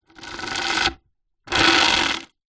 industrial chain metal medium move over wood rattle